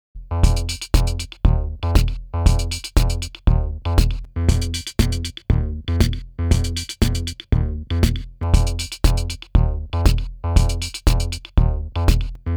Rupert Neve Designs Portico 5017 Blend Phase on off